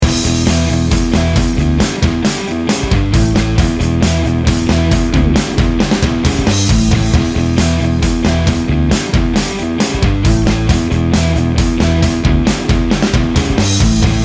party power loop

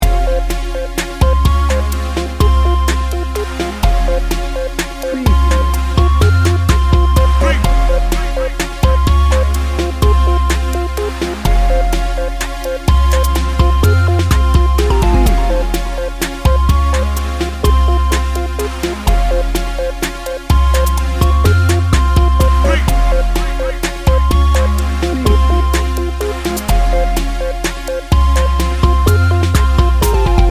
smooth chill loop

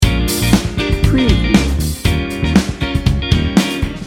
funky and cheerful loop

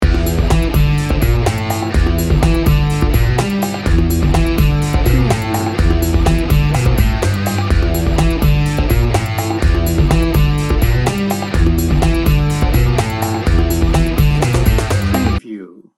criminal chaos loop

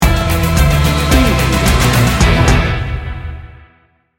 epic and determined sting bumper

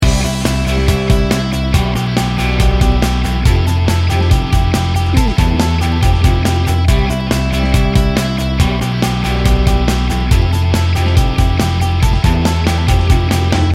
enthusiastic pop rock loop

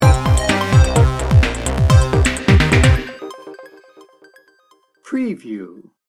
future innovation sting bumper

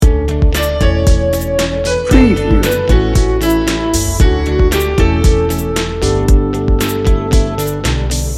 lifestyle loop

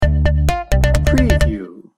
whole lotta perky sting bumper; Cheerful, lively Latin track. Featuring punchy horns, sensuous piano with sax and trumpet

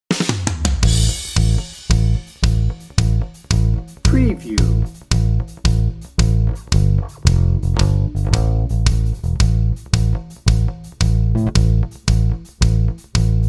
river ride dnb

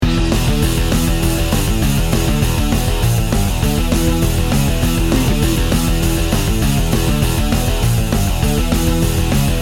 power source loop

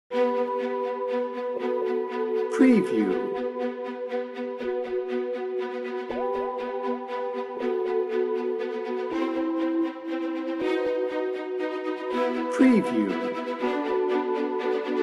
bed no drums

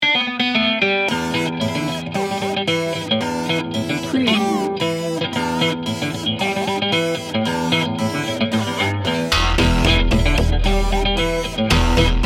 memphis road trip no drums

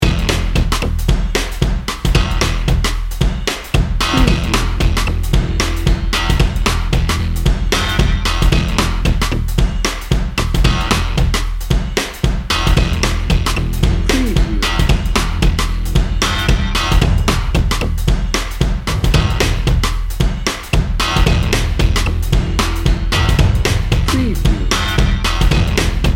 memphis road trip dnb

